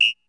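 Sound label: 727 samba whistle, Roland TR-727; Giga Samples Collection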